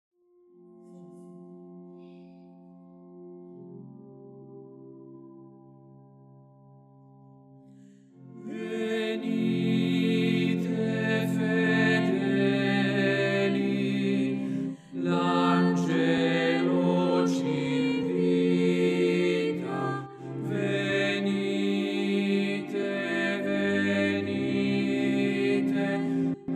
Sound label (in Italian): tenori